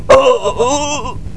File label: scream; PC Gamer 5.6